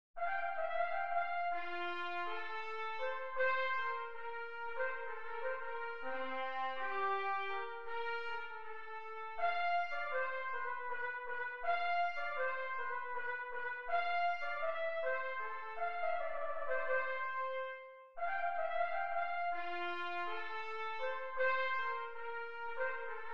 menuet quintette cordes; boccherini luigi

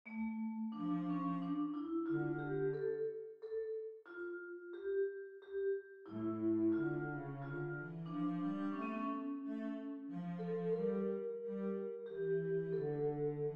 menuet mineur; lully jean baptiste

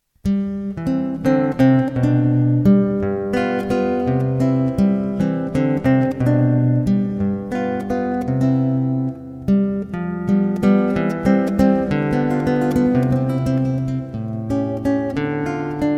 valse; Carcassi, Matteo

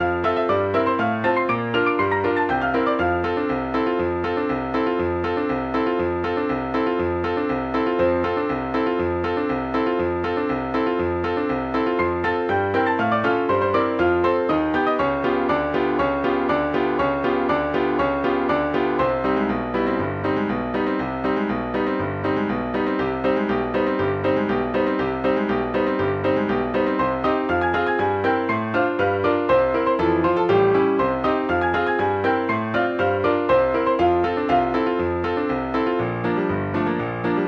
pour piano 4 mains; Satie, Erik; la belle excentrique

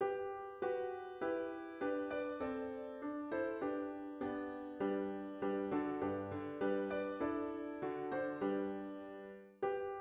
piano pour repetitions; les anges dans nos campagnes; traditional

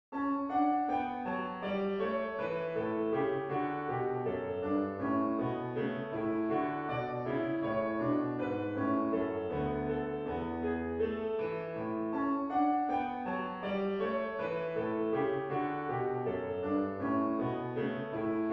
rigaudon majeur; krebs johann ludwig

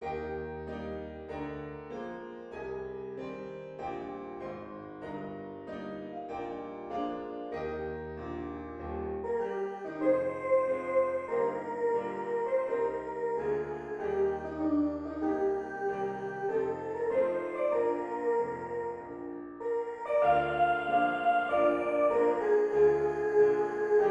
hymne national portugais; keil alfredo; portugueza

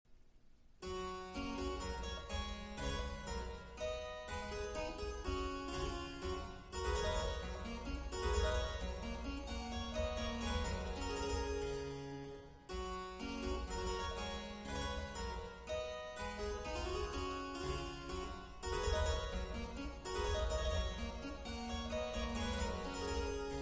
menuet

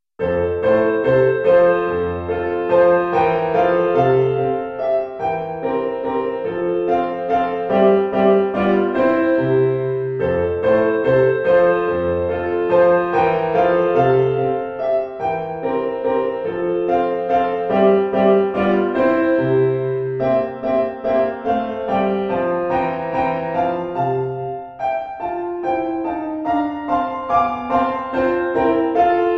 menuet; Charles Czerny

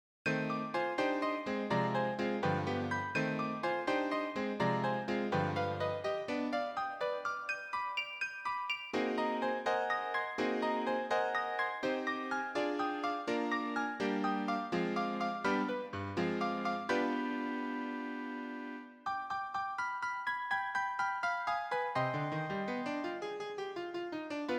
phantaisie sol mineur fantasy

